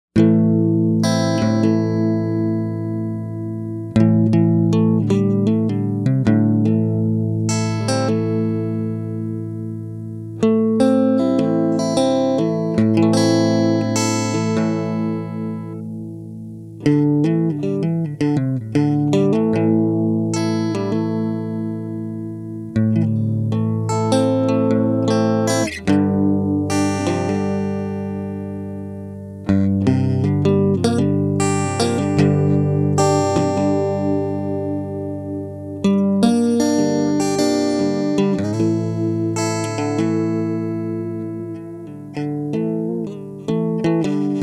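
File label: dreamy improvisation; amp head; dr zee se cdc amp head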